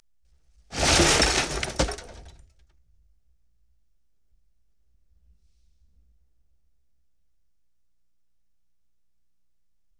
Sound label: Explosion, 5.1 Surround; Sony Pictures Sound Effects Series